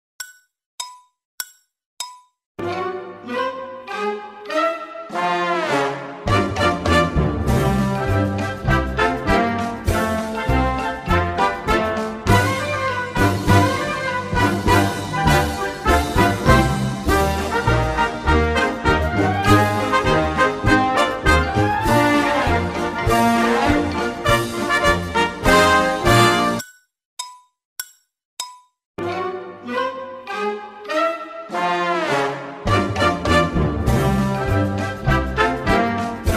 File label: spirited step in time 100bpm; Mary Poppins